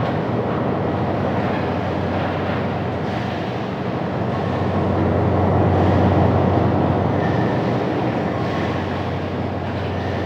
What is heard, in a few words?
Asteroid Interior Amb; Warframe; Grineer Asteroid